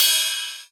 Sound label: Rides, Cymbals